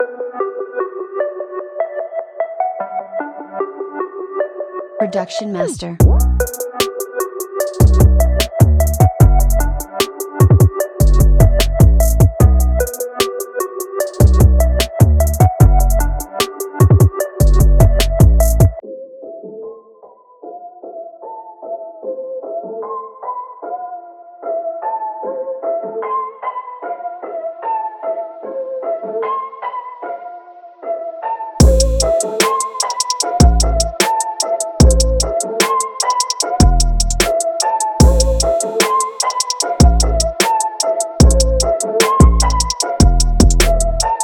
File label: Trap; Production Master